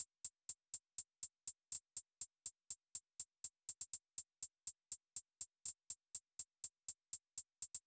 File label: Drum Loop 122 BPM Hihat; Cymatics; Vibes II Drum Loop Collection; Connection Drum Loop